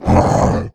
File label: attack, male zombie; nmrih; zerosiki; slender